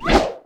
crit attack; LoL; urf rider corki

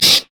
lesser wraith effort; LoL; monsters

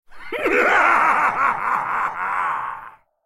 Demonsword laugh; Tryndamere

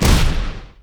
dragons descent; LoL; shyvana

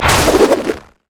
bola strike; rengar

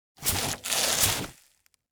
young lizard bored; LoL; monsters

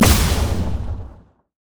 lux finalesfunkeln; LoL

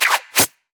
idle recall; Diana

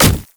monkey king basic attack; LoL

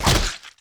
wolfman vs flesh; LoL; warwick

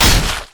anchor chain enemy; nautilus

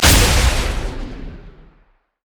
passive ranged; LoL; jayce

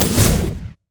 light strike; LoL; lux

